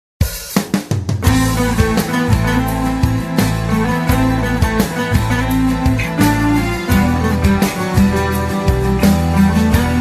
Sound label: New Turkish Music